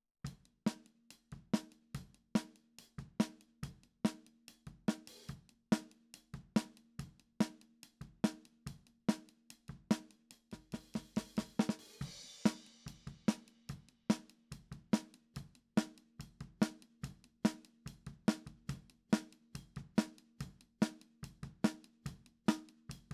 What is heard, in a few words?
Snare Top